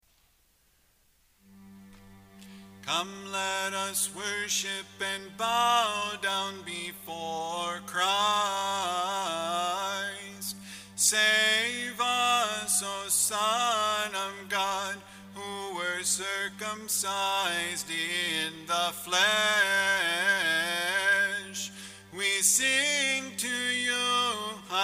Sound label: entrance hymn, Mode 2